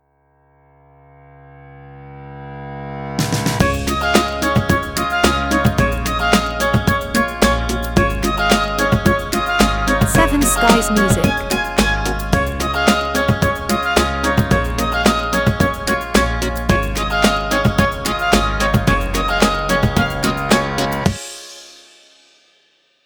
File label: Wonderful Life - jingle